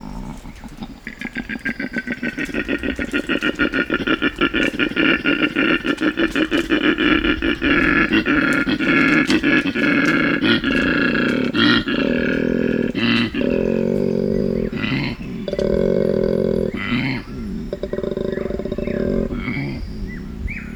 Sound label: Male koala bellowing